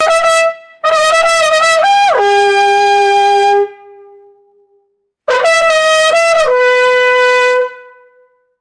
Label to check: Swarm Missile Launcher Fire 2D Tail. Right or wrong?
Wrong